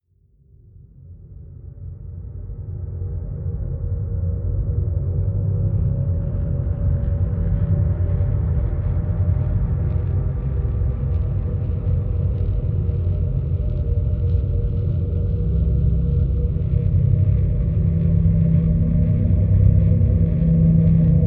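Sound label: drone hypersleep c; brand x music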